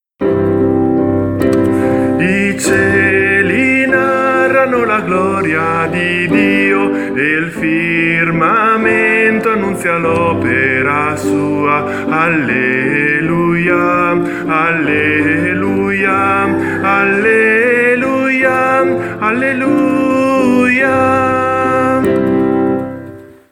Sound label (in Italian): SOPRANI